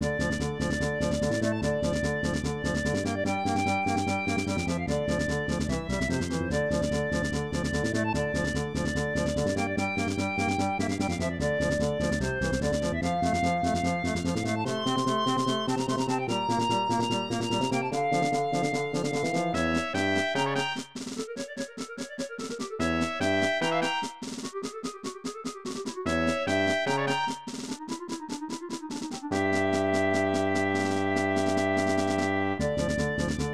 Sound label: Battle